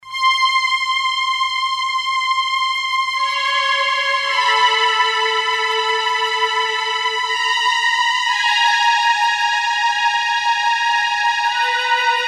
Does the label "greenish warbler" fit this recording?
No